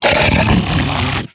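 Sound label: attack; sinfar haks; sf effects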